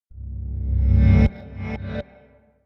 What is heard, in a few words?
short dark chord